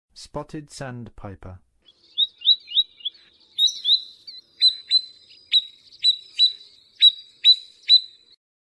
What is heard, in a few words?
Spotted Sandpiper, Songs of Birds of North America; Western USA; Western USA Songbirds